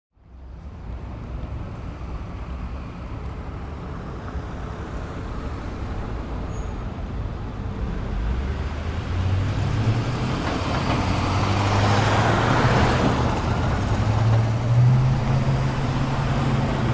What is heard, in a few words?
intersection